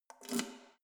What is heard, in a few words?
letterbox metal insert key